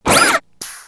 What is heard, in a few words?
BroomBot servo; creatures mechanical